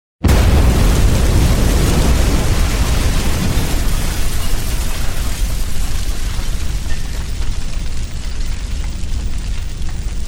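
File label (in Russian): взрыв и огонь долго горят обломки; Звук взрыва от бомбы или снаряда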